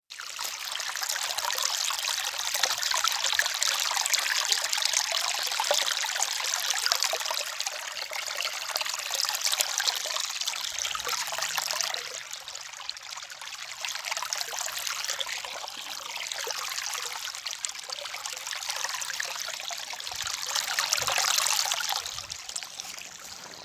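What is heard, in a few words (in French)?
Cascade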